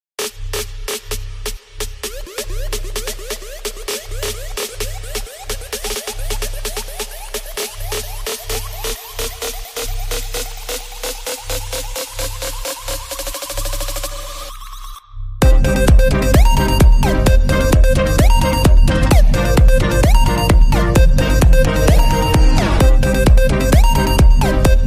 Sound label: instrument ringtone piano ringtone music tune sad; apple